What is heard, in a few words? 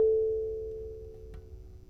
Thumb Piano, World Percussion One Shots; Sony CS